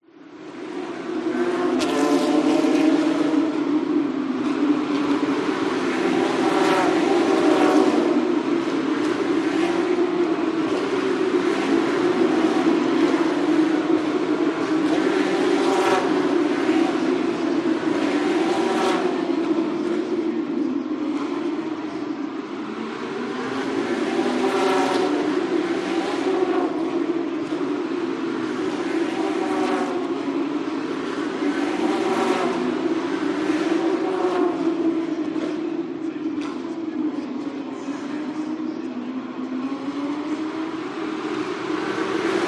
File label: motor racing porsche carrera challenge announcer in bg singapore